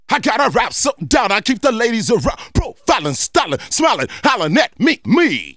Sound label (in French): Choeurs & effets; L'Effet Pommier - Volume 04